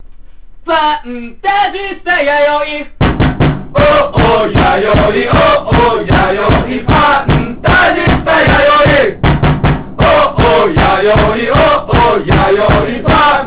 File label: song